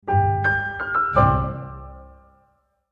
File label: logo